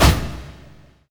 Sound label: Tom, Percussion; Vengeance Samples